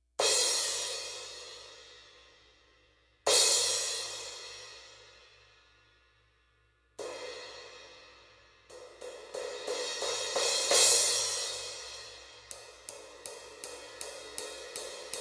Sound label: Zildjian 16 A